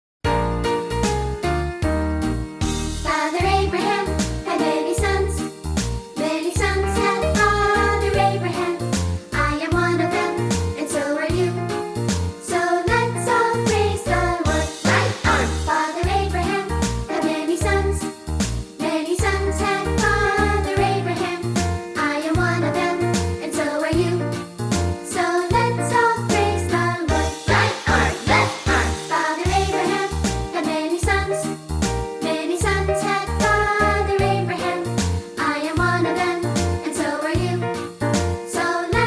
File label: Childrens Choir